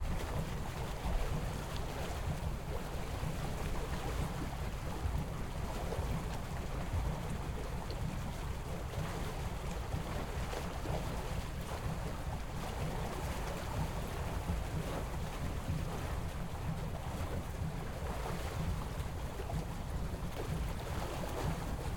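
tugboat onboard idle steady deck propeller, Tugboat Deutz VM 145; MKH8040; Pole Position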